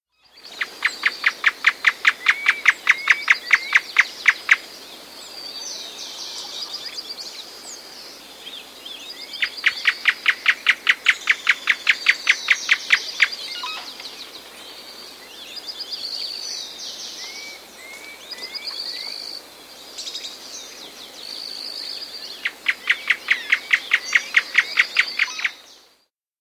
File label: Serra Antwren; S. America